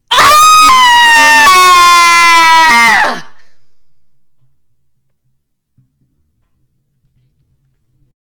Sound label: Woman Scream